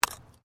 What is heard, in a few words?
Digging Small Handheld Tool Rocks